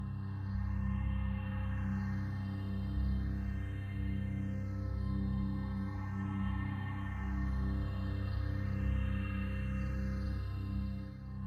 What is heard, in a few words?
Menu drone; Spider-Man 2 The Game